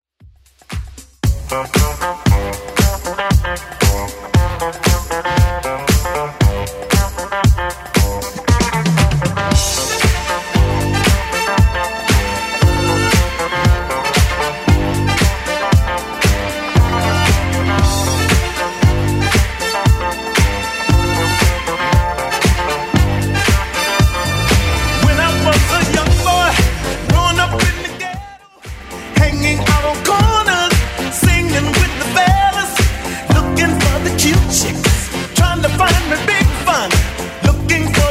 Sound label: 80s Club Edit